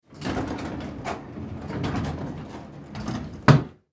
Industry Service Elevator Door Close Manual; Elevator Doors; SFX Bible

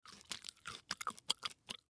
Human Bite Food; Bite Food Chew Suck Candy; Eating; Blastwave

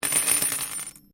Coin Penny Multiple Drop Laminate

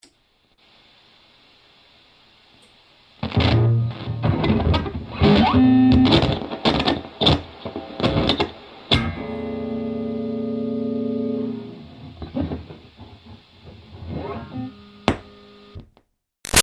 Guitar Electric Amp On Fiddles Off; Switches; Objects; Big Room Sound

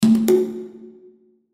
Percussion Hand, Percussion Hand Drums Accent; Hit; Blastwave

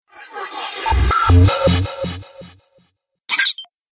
Dubstep Scifi Effects

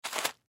Bubble Wrap Place, Bubble Wrap Place Object On; Bubble Wrap Place Object On Plastic; Foley

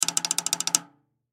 Drum Snare; Snare 14 Inch Pacific Rim Shot Drum Roll Clicks; Drum Kit Sound Effects; Blastwave

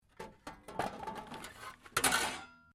METAL SCRAPS MOVE; Metal (Sound Effects:Materials)

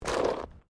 Body movement Slide Gravel; Body movement foley to simulate falls and deaths; Bodies (Sound Effects:Human)

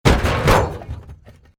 CRASH VEHICLE LARGE, vehicle accident sounds; A series of vehicle accident sounds created using FMOD; Crashes (Sound Effects:Objects)

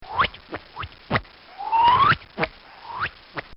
Comical Sound Series Whip Spit; Funny Sounds

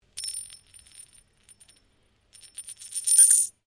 Coin Penny Drop Stone, Old Australian pennies; Money

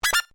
UI Rollover Major, User interface feedback sounds

